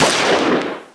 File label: gun